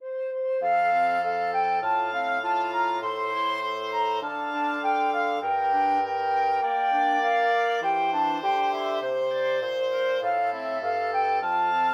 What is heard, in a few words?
flexible mixed ensemble, 4 players